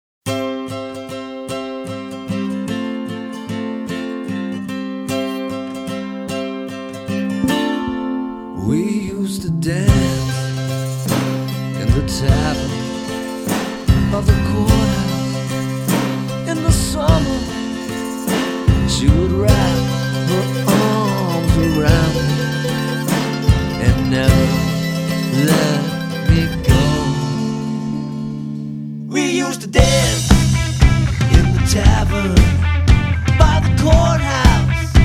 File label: golden tambourine